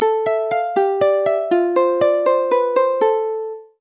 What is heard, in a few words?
lute drama; Wクリックすることで、２種類の音(BGM１＋BGM２)が同時に流れる凝ったギミックになっています。; Sonomaシャードで入手出来たイベントアイテムです。